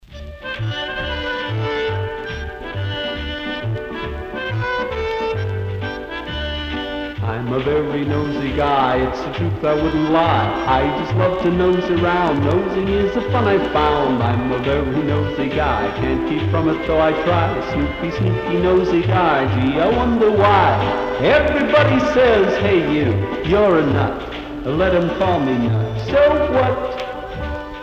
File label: Polka